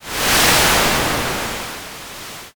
wind

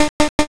pico eat

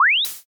warp out

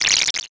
text anim

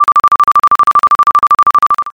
trainbell

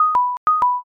cuckoo

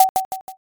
shogi

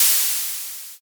steam

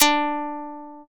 shamisen

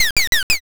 bird scream

